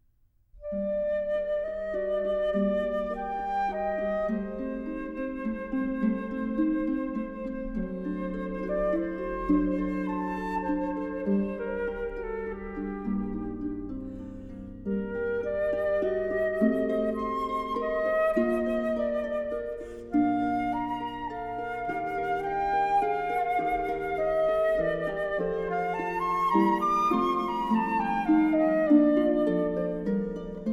Sonata for flute & keyboard in E flat major Siciliano; BWV 1031; Bach for Babies; Fun and Games for Budding Brains